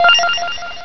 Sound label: Shield Spot Complete; Loony Labyrinth 1.02 Demo